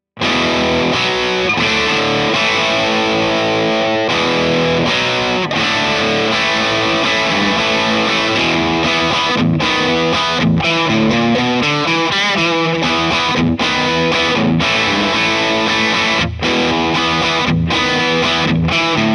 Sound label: rock chords; jensentone